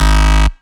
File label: Bass Shot G#